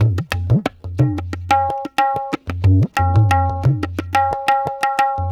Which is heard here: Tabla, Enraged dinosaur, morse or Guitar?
Tabla